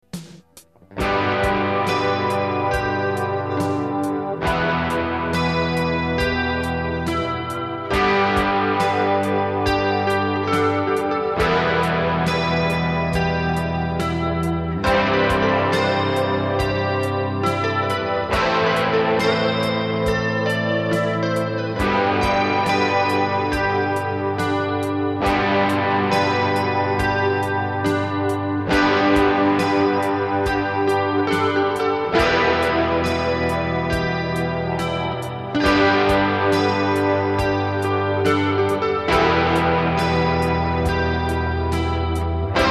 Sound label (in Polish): disco polo